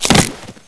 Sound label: player fall pain; svencoop